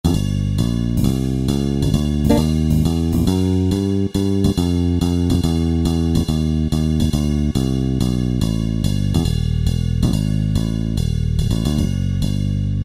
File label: Bass & Dual Ride, Kurzweil K2000; Kurzweil K2000 Value Pack; Extra Programs